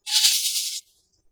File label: squeeshy medium; CinemaSpice; Mograph Mini Transitions